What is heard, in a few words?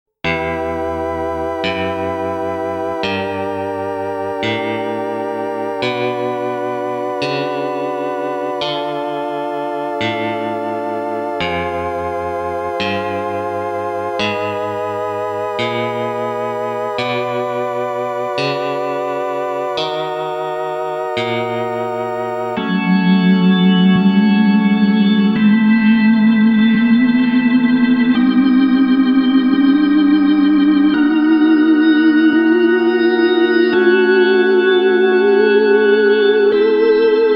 Theremin; Grabbed by the Ghoulies; Xbox; Ghoulhaven Hall